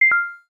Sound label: Video Stop, ui